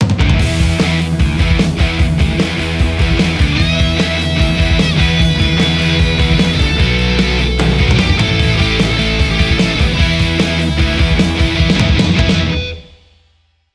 revolution z modern; ronald

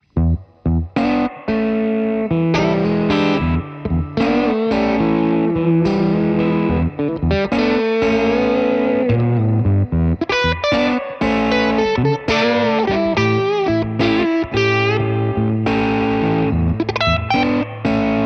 blues les paul emissary; igniteamps

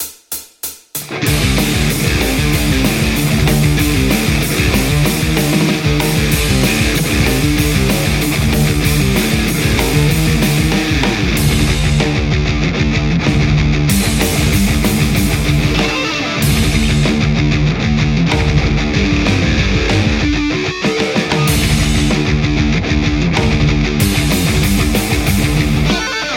metal strat; poulin; lextac

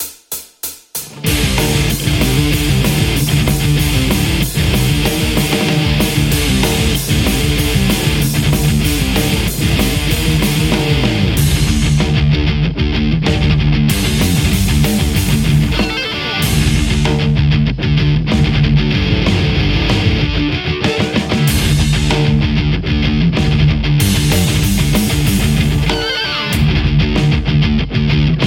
metal Les Paul; bteaudio

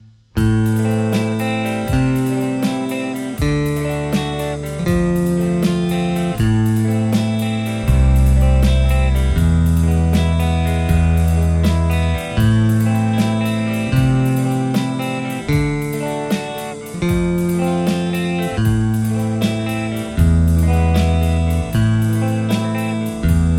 tele harlequin; mercuriall

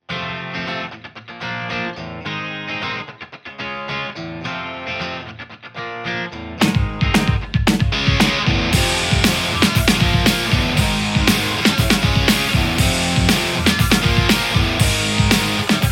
tele, JCM800 hot; mercuriall

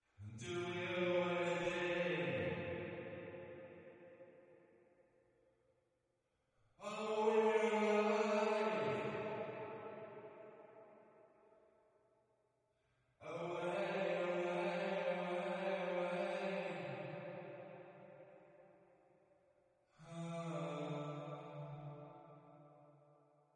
vocals back reverb

